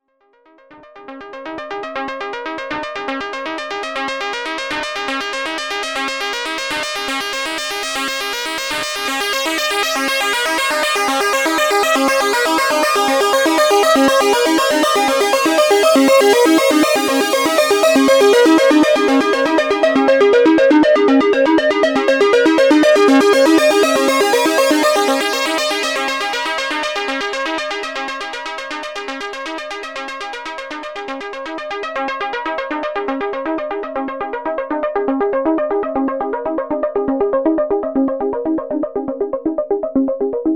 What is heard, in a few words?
2 Square Plus Pitch Env